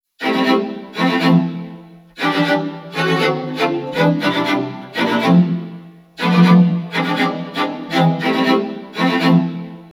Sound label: strings azimuth 0 spread 60 incoherent